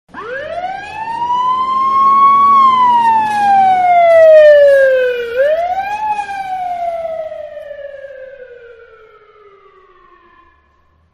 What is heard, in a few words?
Ambulance Siren Pass By; Emergency Vehicles; Manhattan Production Music